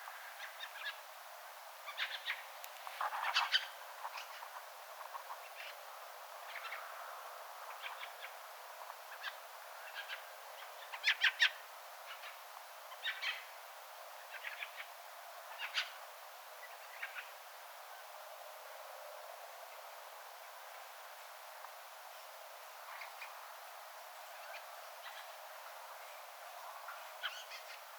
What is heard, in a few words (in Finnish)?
ilm kaukaa idasta tulleiden rakattirastaiden aantelya